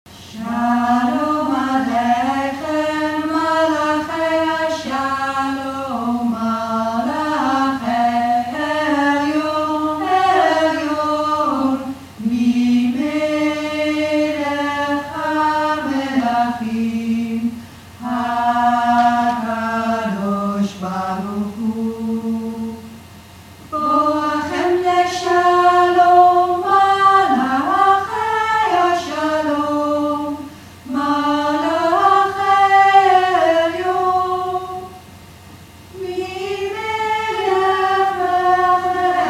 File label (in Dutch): shalom lage stem